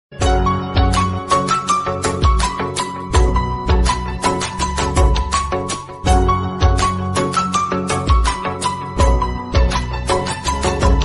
tamil love bgm

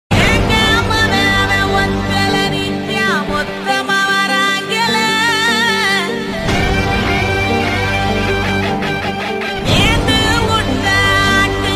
bgm ringtone tamil bgms

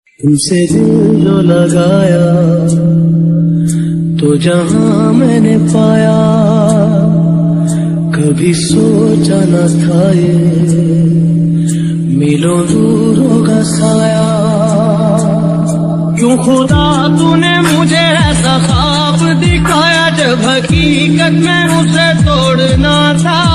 lofi ringtone hindi relaxing; mind relaxing

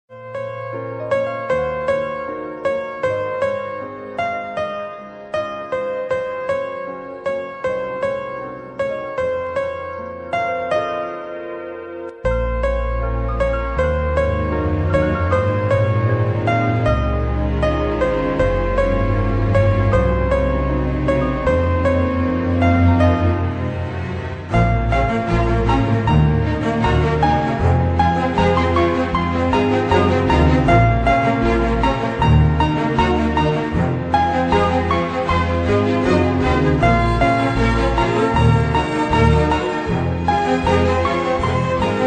tamil love bgm; Thanga Magan

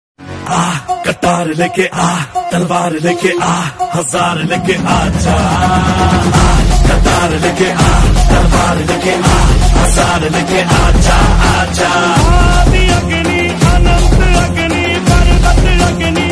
Ringtone; kanguva

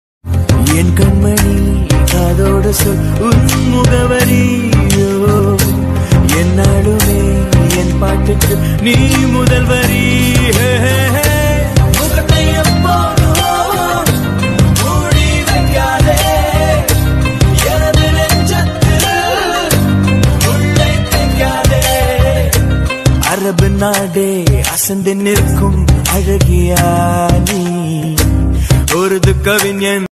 song bgm crush bgm addict bgm

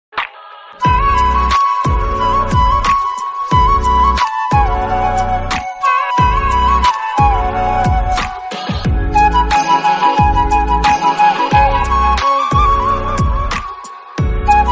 ringtone flute

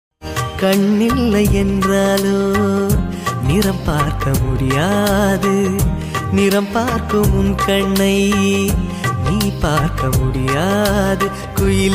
tamil love melody

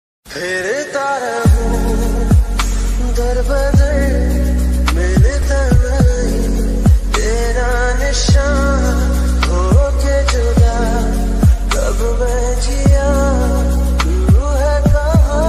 ringtone music

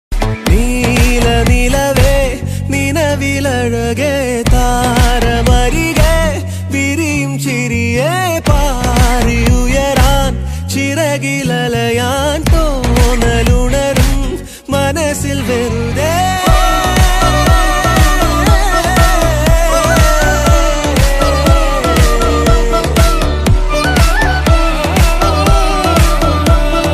ringtone bgm dance music trending malayalam

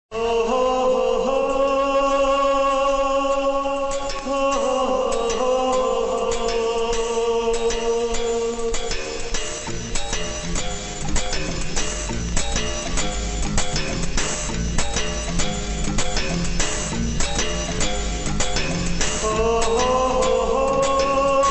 movie full background music ringtone; khalnayak

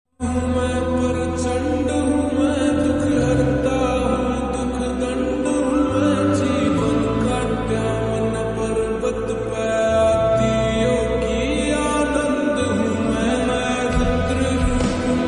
Bhakti ringtone; mahadev ringtone; bholenath ringtone

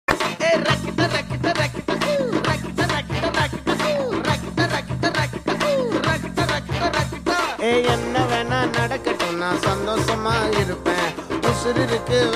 ringtone

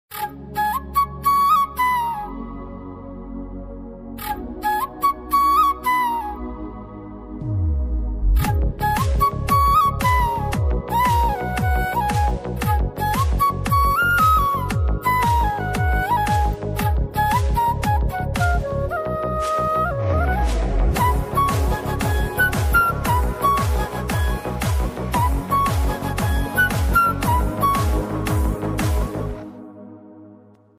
flute bgm ringtone flute music bgm ringtone mix; krishna; chota bheem krishna

